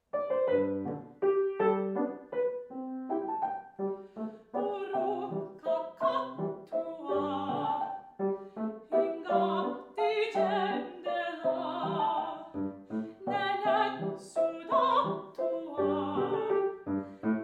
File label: Malay folk song; Burung Kakak Tua